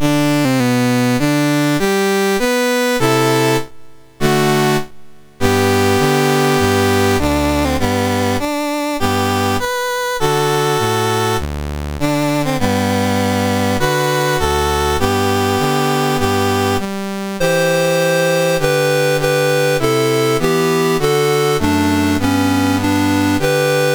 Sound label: Benin, National Anthems, MOS6581R2